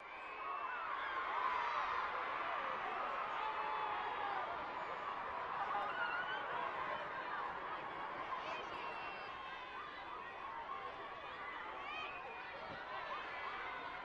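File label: Children Group Ambience Yell, kids play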